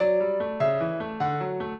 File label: piano; Mozart